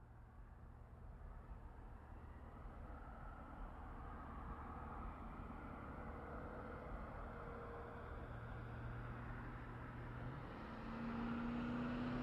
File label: Truck GMC Savana By Fast Revving; Trucks; Vehicles; 2010 GMC Savana; Big Room Sound